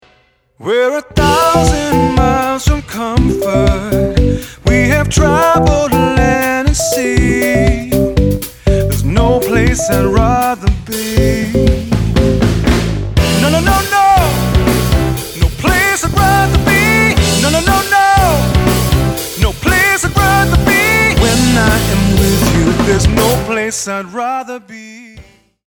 downbeat

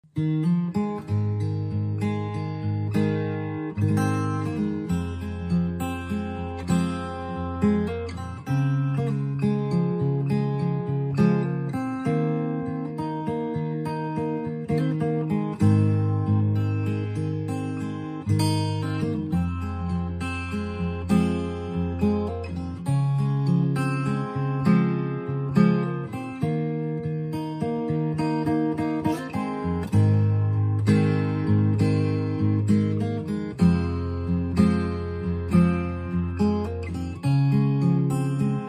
guitarist; Midlands-based fingerstyle acoustic guitarist

